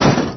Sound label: car hits